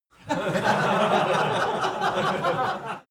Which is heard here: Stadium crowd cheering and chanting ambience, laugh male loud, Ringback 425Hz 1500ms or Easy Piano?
laugh male loud